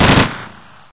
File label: smg1 3 rounds; hl2